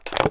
Generic Impact

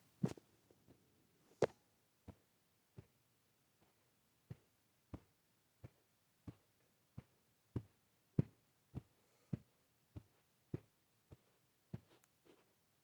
Walking On Carpet

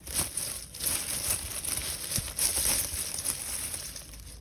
Leaves Rustling

